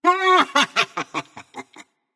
heh heh; smite; janus

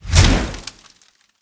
pet deploy; smite; vulcan

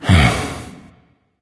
sigh; smite; vamana

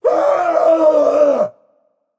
fear; smite; tsukuyomi

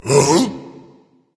confused, berserker; cuchulainn

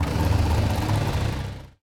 projectile; Smite; god Janus

